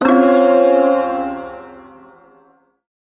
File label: Ersatz Shawzin Phrygian Chord D; Music; Chords; Warframe; Duviri